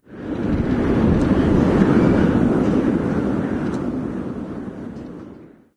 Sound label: random wind gust